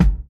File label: old school dry kick drum sound g key, Royality free bass drum sample; kick drum samples